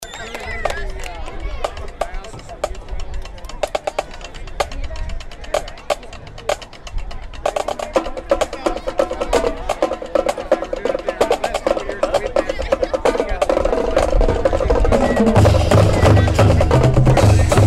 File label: drumline